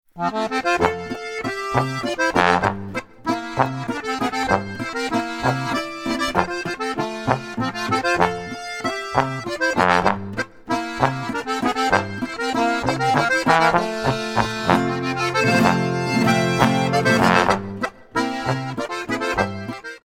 walzer

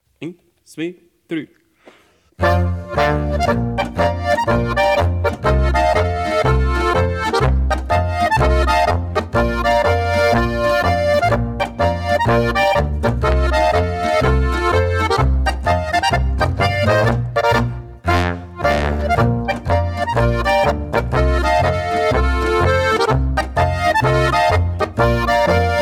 gewitterstimmung

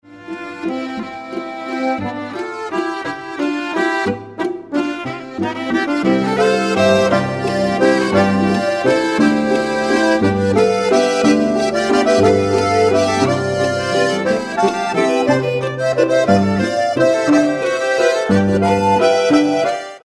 walzer

